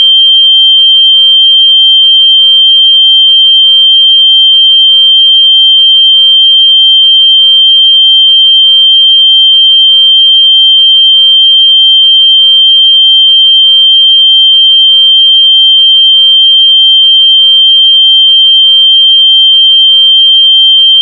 3150Hz Modulated By 0.8Hz At 0.0499 Percent 3.15Hz; 载波3150Hz，被0.8Hz调制，最大频偏3.15Hz